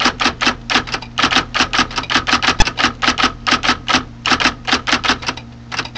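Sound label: typewriter; BUSINESS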